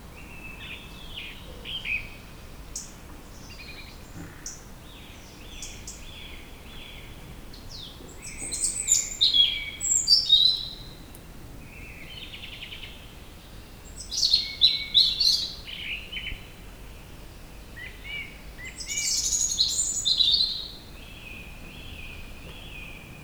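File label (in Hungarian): énekesmadaras riasztó, vízátemelő szivattyú; perkupa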